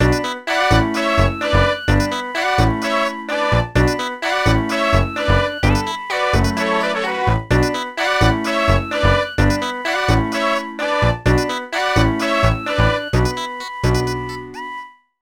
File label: Hiphop